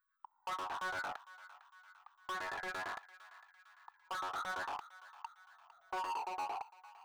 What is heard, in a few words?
132 Arp; Zero-G Trance Inducer; Construction Kits; 132bpm